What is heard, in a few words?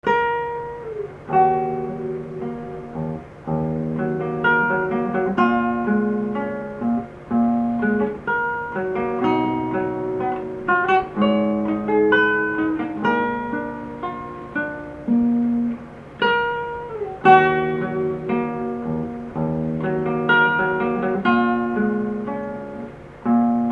Sad Melody